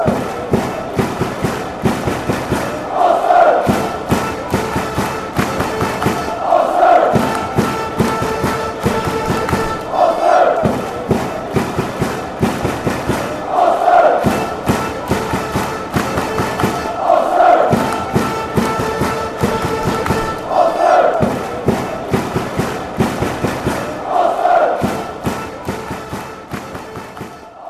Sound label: ulster horn